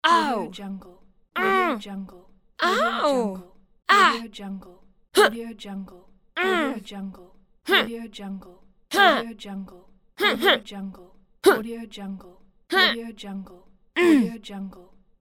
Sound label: woman grunts